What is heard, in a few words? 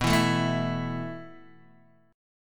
B 7th Suspended 4th B down Guitar DADGAD